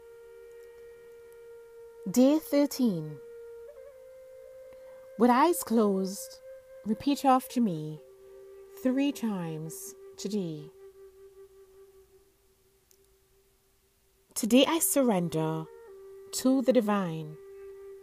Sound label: healing mantra